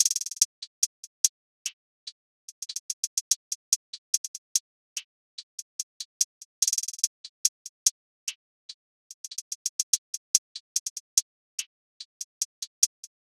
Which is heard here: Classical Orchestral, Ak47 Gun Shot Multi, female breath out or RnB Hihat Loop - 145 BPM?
RnB Hihat Loop - 145 BPM